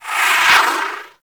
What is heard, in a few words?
Space Fighter Rocket Proj Whizz By; Dredger; Warframe; Vehicles; RailJack; RailJack Enemy Ships; Grineer; Space Fighter Dredger Agent